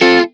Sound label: Synths Root C